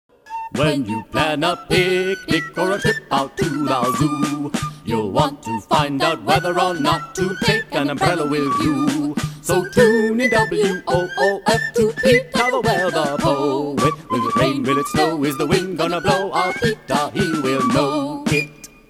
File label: jingle